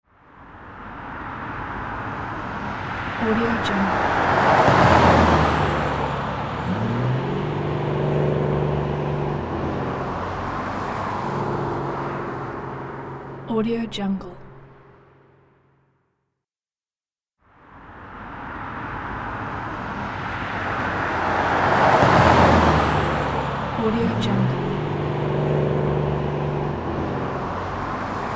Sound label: race car passing by